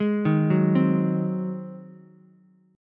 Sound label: Ab 7th Ab down Ukulele; Ab7 arpeggiated; JGuitar